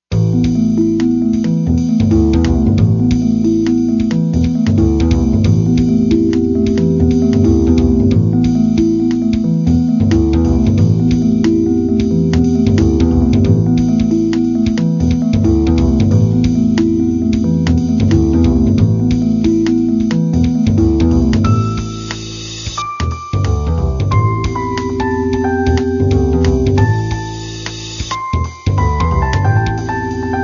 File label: ambient